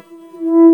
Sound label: E2 METAL SY, XIANG REEDS; NorthStar - The Wizard VOL-2